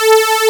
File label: fat Oscillator